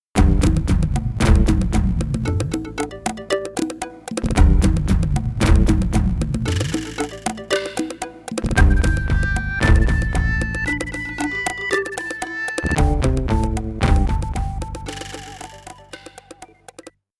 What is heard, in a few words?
Opponent Defeated; Dino Rex